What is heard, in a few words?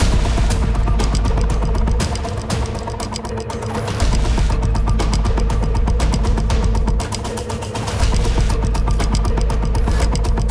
Loop; 猫鼠游戏; Loop阶段